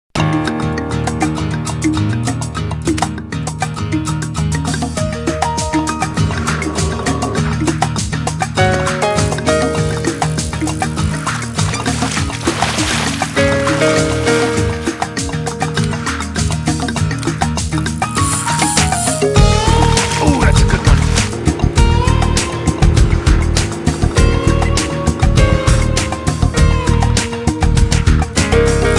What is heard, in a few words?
bass